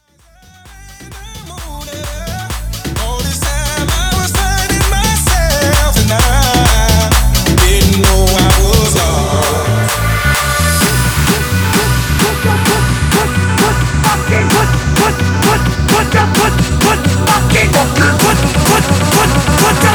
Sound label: tribal remix 130 bpm; tribal bootleg remix